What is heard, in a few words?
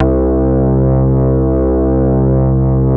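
moog bass; ZERO-G; Datafile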